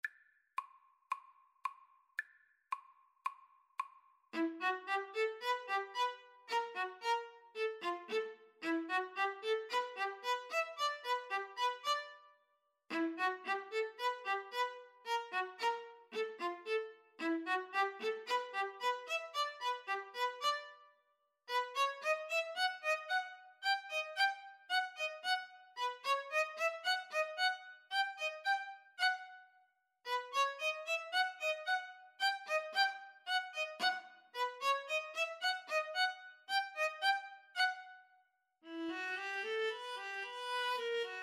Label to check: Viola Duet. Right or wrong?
Right